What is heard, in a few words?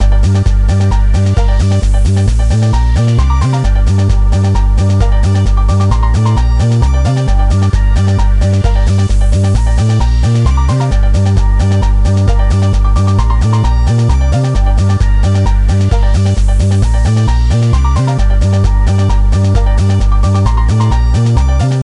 BGM Test; puzzling masterpiece exploration 2009 nds gamerip